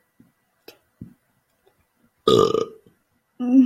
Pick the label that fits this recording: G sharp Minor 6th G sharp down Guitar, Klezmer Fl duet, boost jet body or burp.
burp